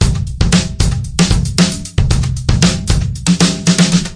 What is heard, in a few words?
115 live kit ringer kick